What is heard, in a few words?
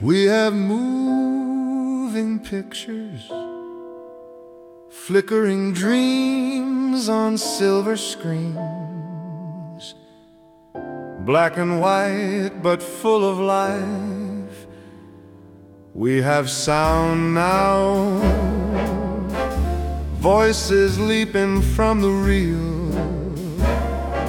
movie music; Marbach